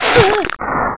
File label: baba strike; zelda